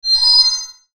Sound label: Metallic Shimmer High; Production Element Imaging Element Accent Transition; Metallic Sound; Blastwave